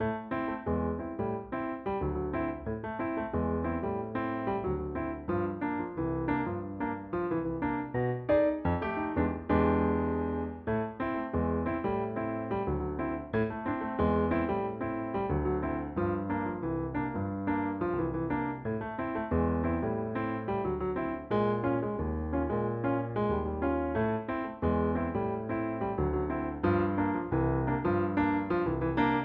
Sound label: solo